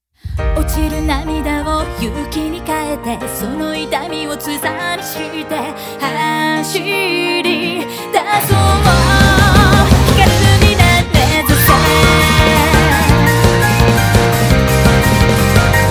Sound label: Pop Rock